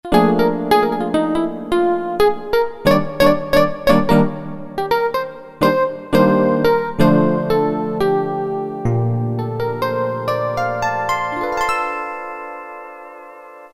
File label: Elec Piano 4, Roland MT-32